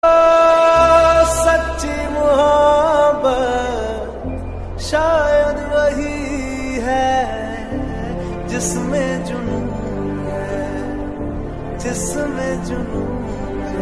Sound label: bollywood sad ringtone